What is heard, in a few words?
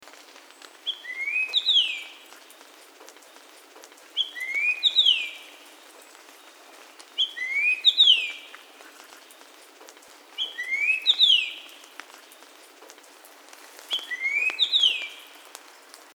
Eophona personata song; passeriformes; fringillidae